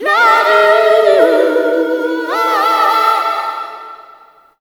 YOU AHAHAA, Funky Vocals, SHOUTS; USB Soundscan vol.18

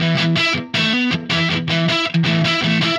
Happy Pub Guitar; Sonic Foundry (Sony Creative Software); Indie Rock Anthems; Session 05 - Happy Pub

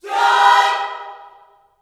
JOY CHORD, Gospel, Vocal; ILIO; Vocal Planet

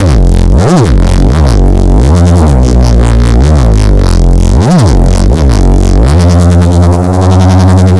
Cheap plastic reese, Neuro Bass; GranularSumo Neuro Bass Pack 01

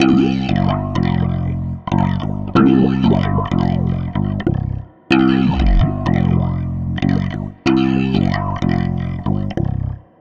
Filtered Funky Bass, Electric Bass

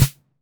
Snare Pairs

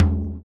surdo; ILIO; Ethno Techno

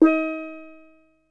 Steel Drum, Ensoniq SQ-R Plus

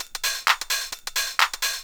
drum 130 hihat, Techno Trance, Drumloops

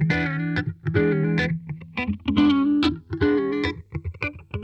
SLO FAZE, Funk Guitar; 90 s Sample CDs; Zero G

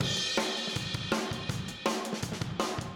Room M, Drums, 162 BPM; Take Your Toms Off

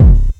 KICK R; Big Beat Samples & More; AKAI

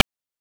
PITCHED NOISE; NES DPCM; MIDINES

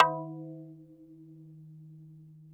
D#2, Yamaha VP-1; Music Store; 90's Sample CDs; MIYABI

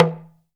TALK DRM, Heart of Africa; Spectrasonics

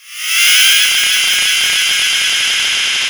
FX AMBIENT L; Big Beat Samples & More; AKAI; OTHERFX KIT

